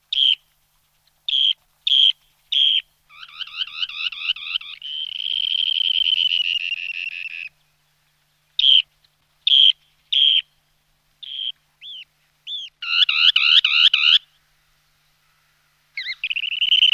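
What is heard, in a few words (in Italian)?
piovanello pancianera, Canto degli uccelli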